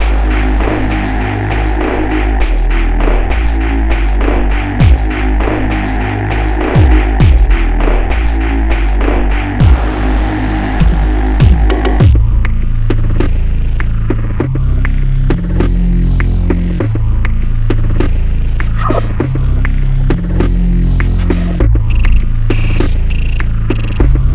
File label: Experimental Ambient Samples; Bluezone